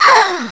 death; quake2; faerie

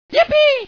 yippee; quake2; chaos; von

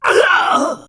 gurp; quake2; bobafett

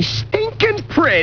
fall; quake2; rattrap

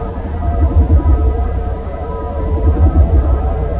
fuel loop; quake2; zepsounds

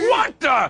pain; quake2; strongbad

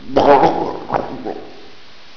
gurp; quake2; dung

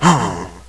jump; quake2; bruticus

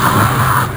gurp; quake2; bug

